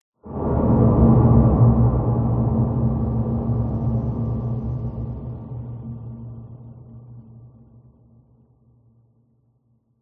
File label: impact distant dark metallic huge attack muted rumble swell ponderous; ominous; Skyclad Sound